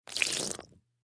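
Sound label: Small Spill Carpet Close Up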